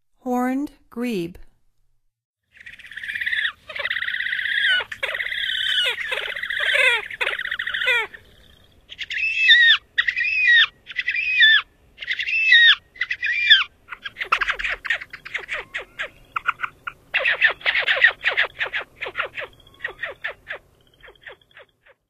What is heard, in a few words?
Horned Grebe, Songs of Birds of North America; Stokes